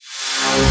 reverse, FX; Vengeance Samples

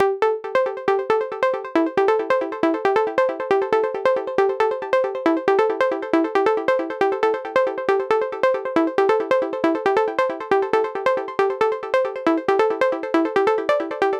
137BPM Pluck Full, Dminor; Unlooped; Universe Kit; Vengeance Samples

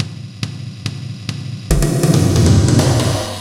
Fill 140 BPM

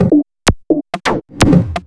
VFX2 Loop 128 BPM

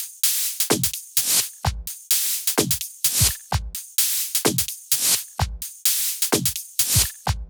128BPM The Big Groove Kit; Vengeance Samples

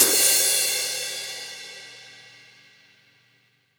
Crash, Cymbals; Vengeance Samples

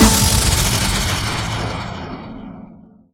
FX Scratching; Vengeance Samples

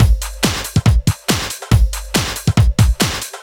Loops BB 140, BreakBeats; Vengeance Samples

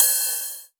Ride, Cymbals; Vengeance Samples; VEH2

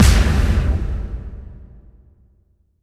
FX Impact; Vengeance Samples

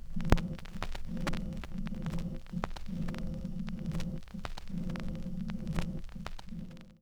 Record End, Vinyl Record; Record Noises

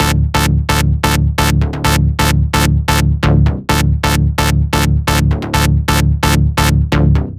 130BPM Melody; Vengeance Samples; Organity Kit

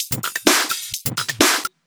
Electro Loop 128 BPM; Vengeance Samples

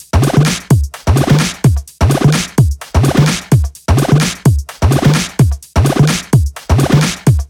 128BPM Tribal Kiss Kit

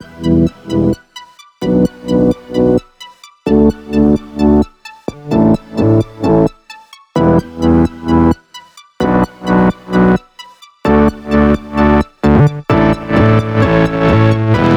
130BPM Melody; Vengeance Samples; VFH1 130BPM Tibet Kit

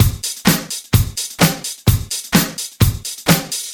House Beat, 128 BPM

